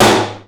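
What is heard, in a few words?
processed tom, drums; Crypton - DrumBase5000; PROC.DRUMS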